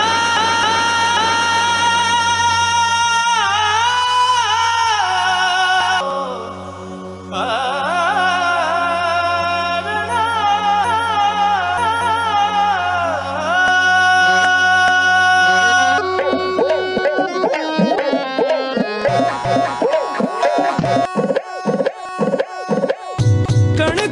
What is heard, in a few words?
tribal